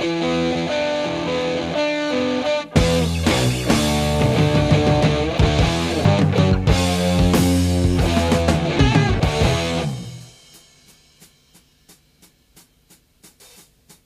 guitar, Loops

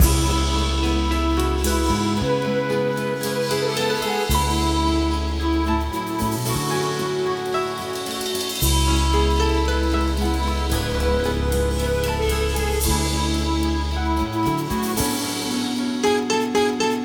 flute, Sad

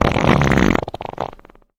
fart longish; Farts

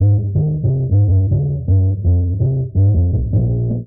bass

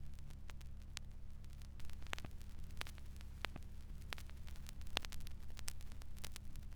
crackles, Vinyl

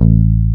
beehive, Bass; Notes

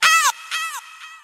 ow, Voice ooh ahh ow ouch

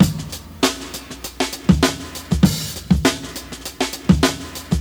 enn tea, Drum loops Boombap

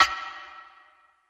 tapper, Ticks; Misc Percussion

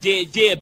"deah deah", ooh ahh

